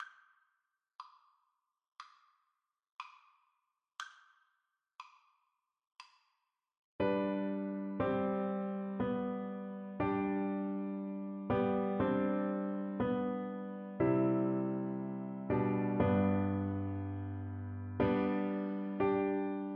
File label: girl left, recorder